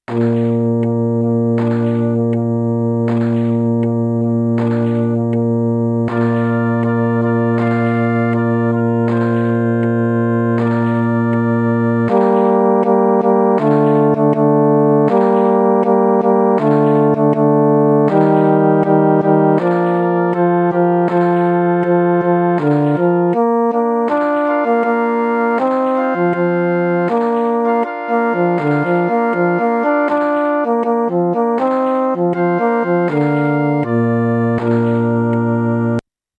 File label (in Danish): midi